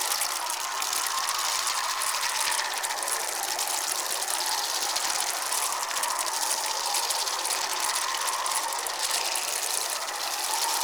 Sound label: Arctic Snowglobe Loop; Warframe; Eximus